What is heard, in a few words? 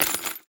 war ui inventory ammunition shells pickup